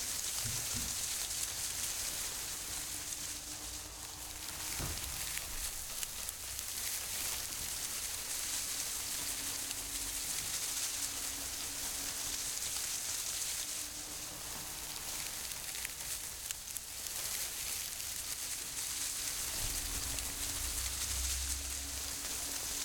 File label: Tree Leaves-Rustle-Close Loop; Viewfinder